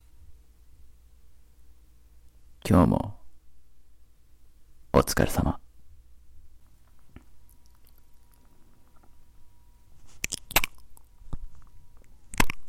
耳舐め, ASMR; 僕は君が思うほど大人じゃないよ

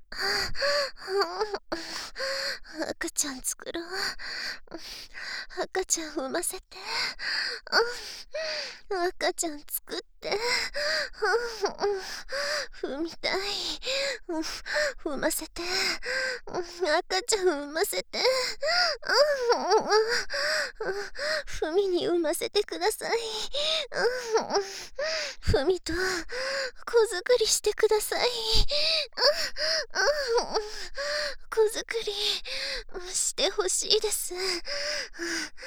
喘ぎ, 左, バイノーラル; 赤ちゃん作ろ♪; ハーレムオナサポ 4人のメイドがご主人様のオナニーをお手伝いするバイノーラル～文、千曜、名月、莉桜～

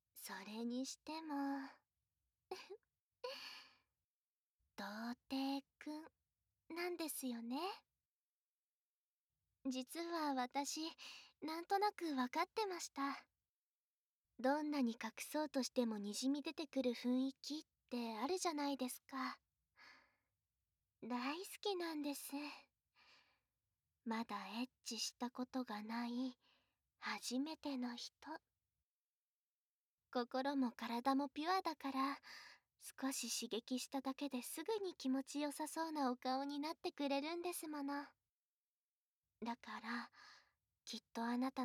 密着耳舐め; シャワー室で

